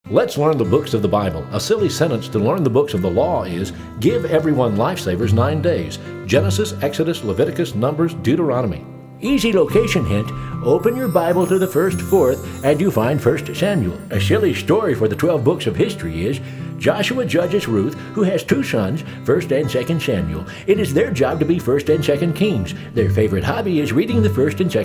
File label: old testament books, singing bible drill